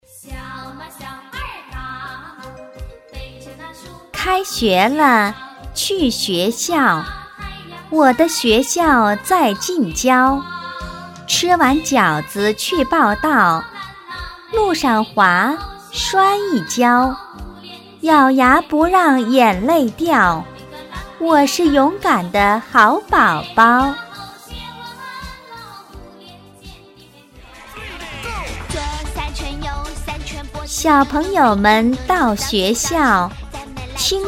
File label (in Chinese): 女 儿歌